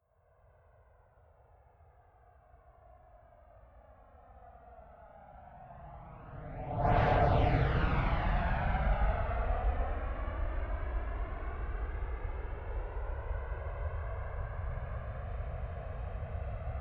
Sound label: military jet flanker-c turbine flyby right to left distant